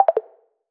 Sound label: Cancel